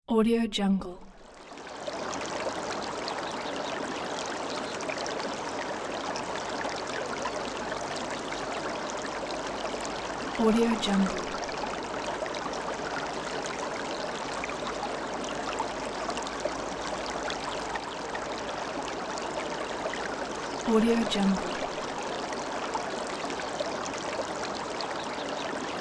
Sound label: flow water